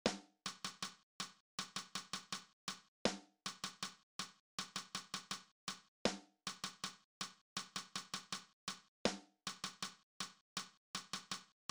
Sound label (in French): le roi lion Batterie; musitheque